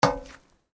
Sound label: Footsteps Iron plate One